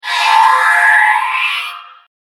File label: Phased Whoosh Sound; Andrew Fleming